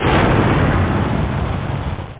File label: HK BLOW; t2029